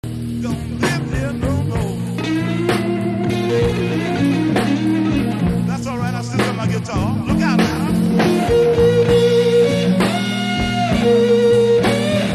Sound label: guitar